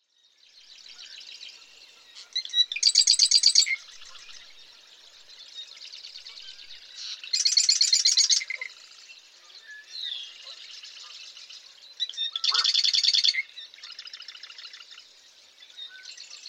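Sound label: Eastern male, Marsh Wrens, Normal songs; Massachusetts; Donald Kroodsma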